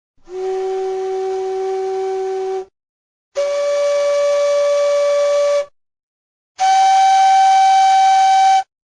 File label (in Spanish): posición 2 gaita macho